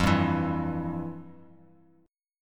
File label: F Major 7th Suspended 2nd F, Bass Standard; strummed; JGuitar